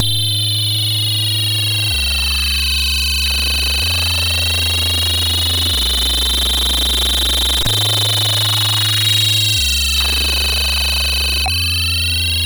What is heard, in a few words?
Jingles, sid MOS6581R4